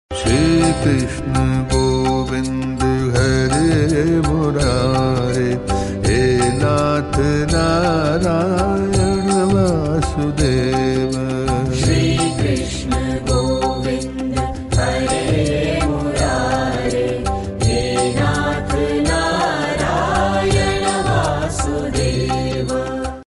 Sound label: shri krishna govind hare murari ringtone; krishna bhajan